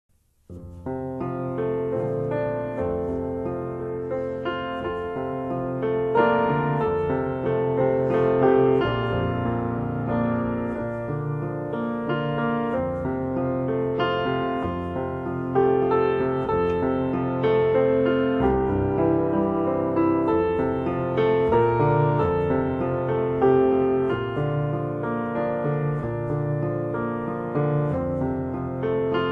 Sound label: near the cross piano solos